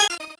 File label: normal hit normal